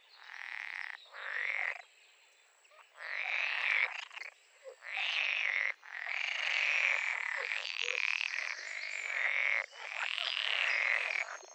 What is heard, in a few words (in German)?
kl. Wasserfrosch; Amphibien; Froschlurche; Stimmen; Oliver Swift